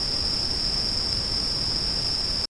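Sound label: night loop; thief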